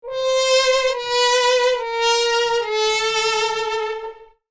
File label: Sad trombone wah wah wah fail comedy; cartoon comedy